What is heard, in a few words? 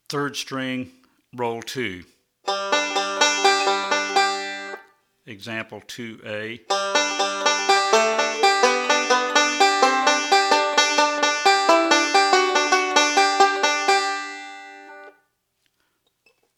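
Rolls For Melody On 3rd String, banjo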